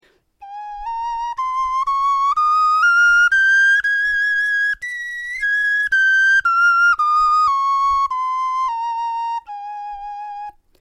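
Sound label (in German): tonleiter, Knochenflöten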